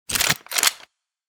Assault Rifle Reload

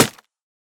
Sword Wood; Melee; Impacts

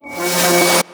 vs windup

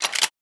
Shotgun Pump; Shooter

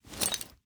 Frag Grenade Equip

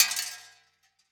Shell Metal

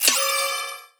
random reveal

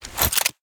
Assault Rifle Equip

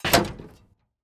Shield Impact

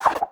options pop in

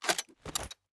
Sniper Rifle Unequip; Items; Shooter

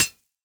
Sword Grass; Melee; Impacts

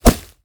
Bullet Impact Grass

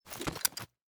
Pistol Unequip

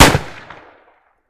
flashbang explode

